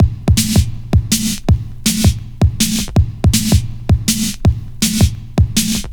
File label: Old Skool, Loops, breaks; m8 breaks